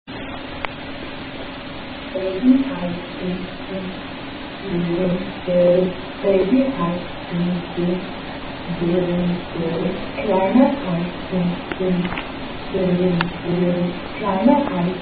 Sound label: German attack song